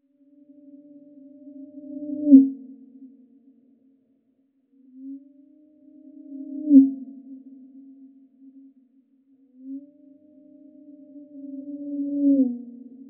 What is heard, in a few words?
Heli hovers